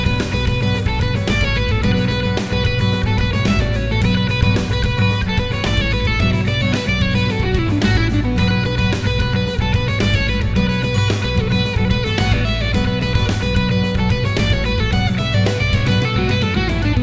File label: Huawei Mate 10 Ringtone